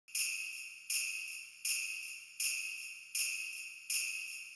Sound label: suzu